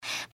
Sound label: breath; pony; Twilight Sparkle